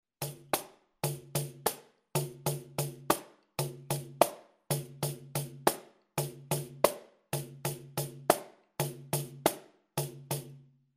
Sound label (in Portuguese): partido alto on, pandeiro